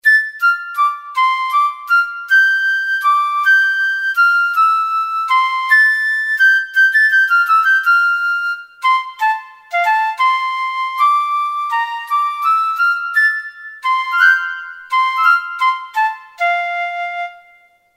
Piccolo; Kurzweil K2000 Orchestral ROM

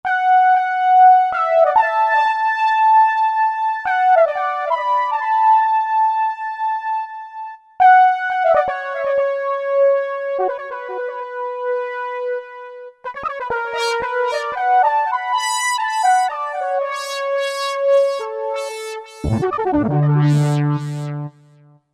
Syn Lead, Roland SR-JV80-09; Roland SR-JV80-09 Session

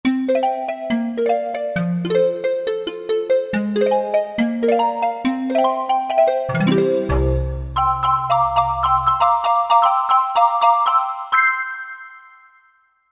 Chiffy Kalimba, Korg Wavestation A-D

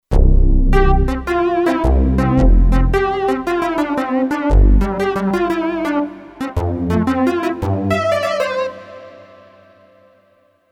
Rusty Teeth, Kurzweil K2500X

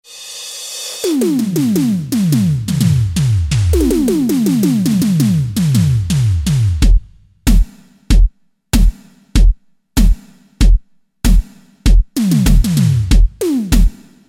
ELECTRONIC, Roland SC-880 Rhythm Sets; Factory

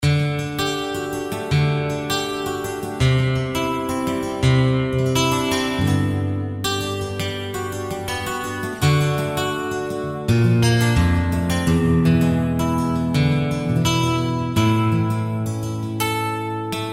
Steel there!

